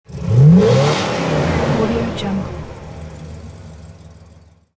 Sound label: car engine revving